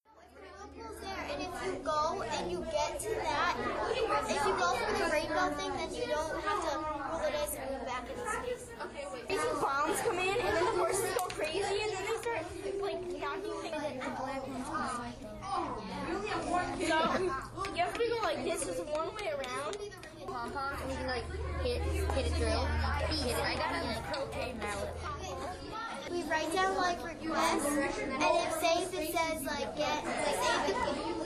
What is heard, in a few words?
Sounds of Hacking Chess